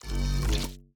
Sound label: gather meat expire